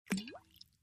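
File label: Splash Small Plop Glass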